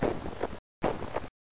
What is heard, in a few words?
RUN